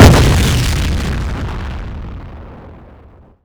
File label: explosion